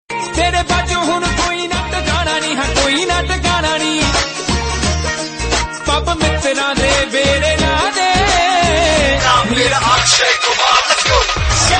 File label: Mix Hindi